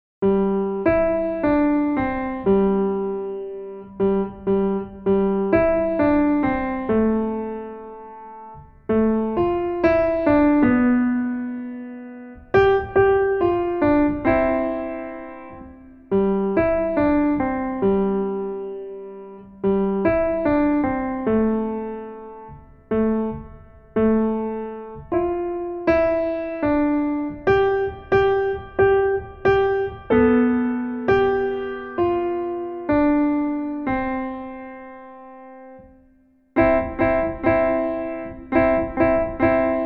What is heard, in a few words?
Jingle Bells